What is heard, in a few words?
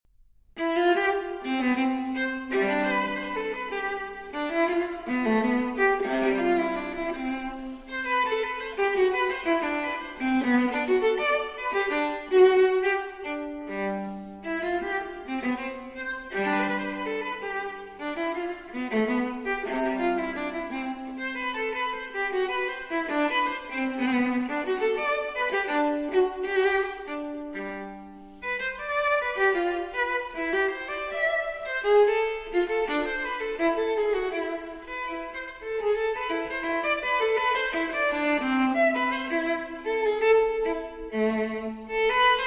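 Cello Suite No. 3 in C Major Transcription For Viola Bourrée I-II; J.S. Bach; BWV 1009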